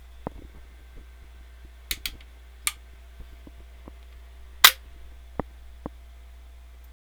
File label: Rifle Lock; forlorn